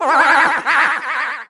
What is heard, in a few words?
uwaaaaah haah haaah long fall; smm wario